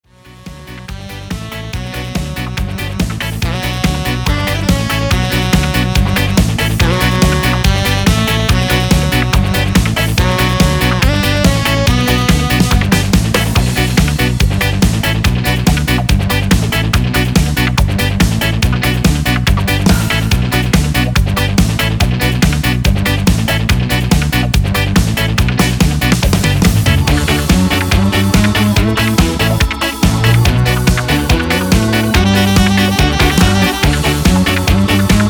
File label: Midi Yamaha; yamaha psr s670